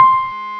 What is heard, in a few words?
Piano; Sound Library 2000; Wayzata Technology